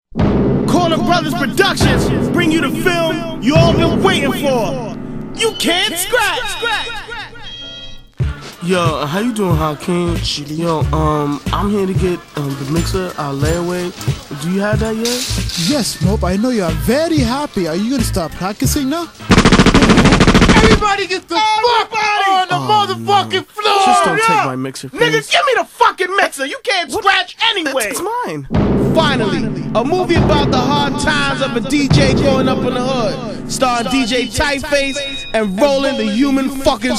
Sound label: scratch